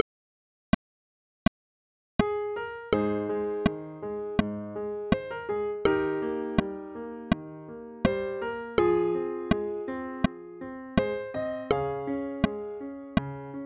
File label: country road G